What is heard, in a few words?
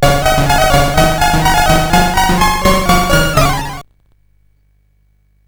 sid CSG8580R5; Astrobot II